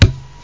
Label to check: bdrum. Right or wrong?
Right